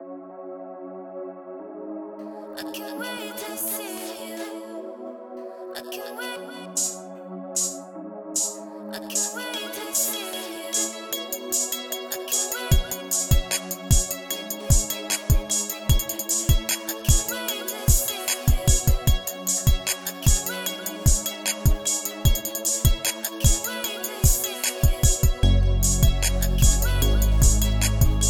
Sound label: Instrumental